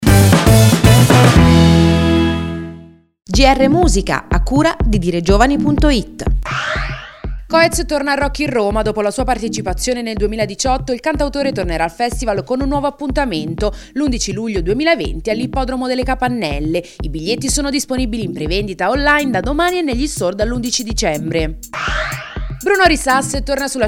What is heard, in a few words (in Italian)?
musica